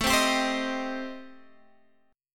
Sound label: A-Minor 7th Flat 5th, Guitar Standard, chord; strummed; JGuitar